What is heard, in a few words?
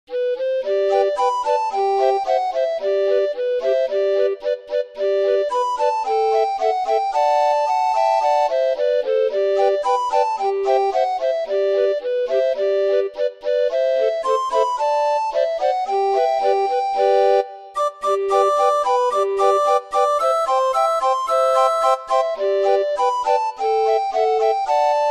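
Peekaboo, orgue de barbarie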